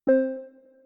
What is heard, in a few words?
join; basic call